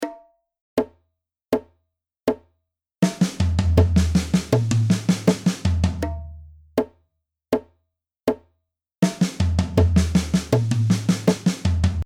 Fills Niveau 2 Ligne 3 80bpm

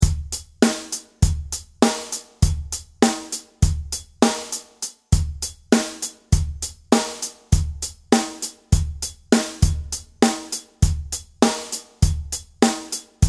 illusion rythmique sans click 100bpm

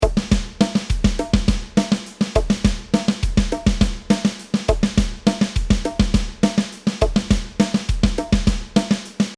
mise en place pied doubles croches 103bpm

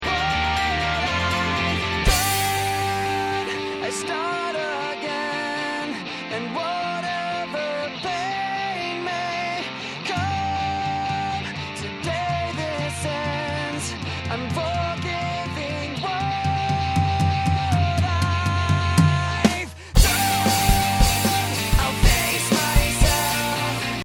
crescendo

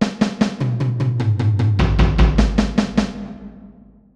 groupe 3 mains

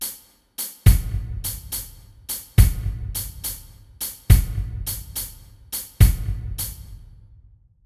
grosse caisse 2 et 4

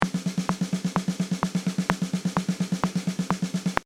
Fill plat

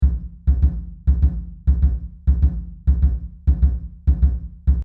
rythme jazz samba grosse caisse

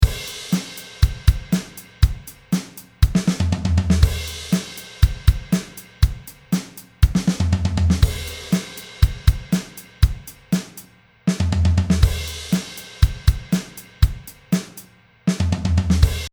fill A trou

